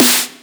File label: Snare; dnb beat ableton